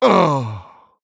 Male Human Death

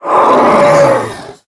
Death; Tyrantus

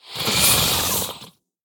Phase Spider Attack

